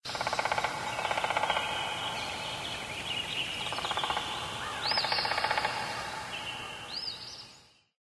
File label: animal call woodpecker; Source Engine; tf; lair